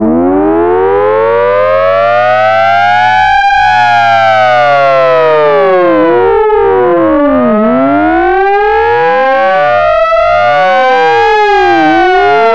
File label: civil defense alert siren